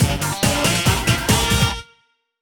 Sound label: Endless Jingle; Buster Jam; MSX2